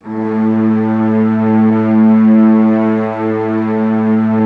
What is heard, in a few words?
LOW A2, Violin, SFT LOW VLNS; Q Up Arts; Denny Jaeger Master Violin Library